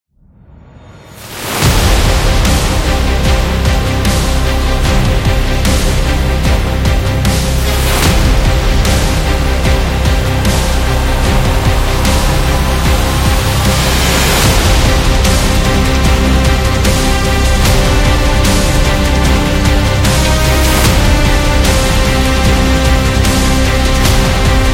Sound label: Epic Battle Trailer